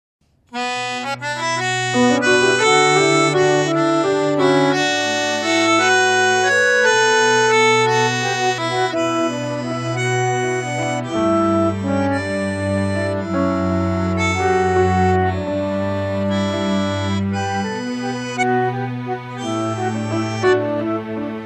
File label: senza choir